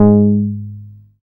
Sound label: BAS MKS-50, Roland